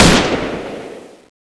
fire, weapons; cso; turbulent3

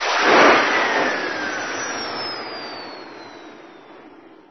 turb down

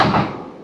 gau21 fire, cannon; wac

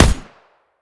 smg1 single

